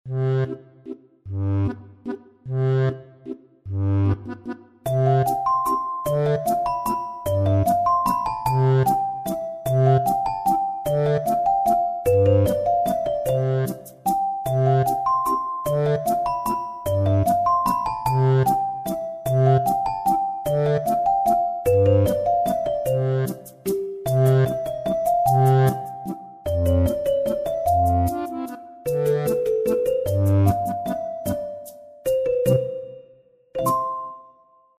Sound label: UK Style, alarms; Oppo; Find 3 X905